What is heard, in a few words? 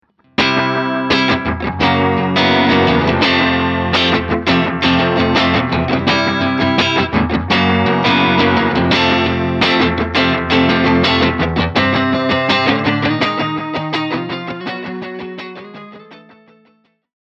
Carbon Copy, analog delay; MXR M-169 analog delay; kostki; efekty gitarowe